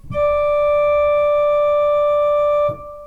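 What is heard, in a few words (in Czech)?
varhany d dur, organ D major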